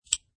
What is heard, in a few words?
USB Retract; Sliding retractable USB thumbstick out - Variation 3; Computers; Michael Huang